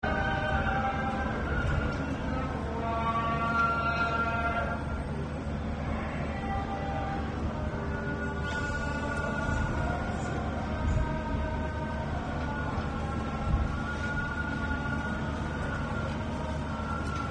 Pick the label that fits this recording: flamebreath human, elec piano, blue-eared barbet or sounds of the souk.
sounds of the souk